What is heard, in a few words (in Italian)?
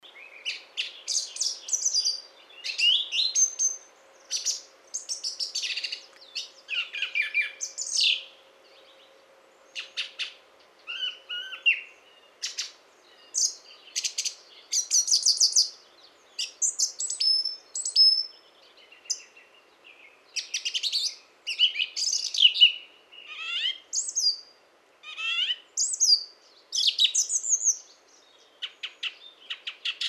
Tordo bottaccio; valsolda